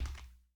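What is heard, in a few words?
Foot Hard Wood Sneaker Walk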